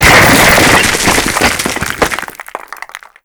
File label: ceiling rock fall break; Call of Duty Modern Warfare